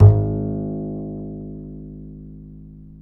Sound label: G#2, Bass; Spectrasonics; Bass Legends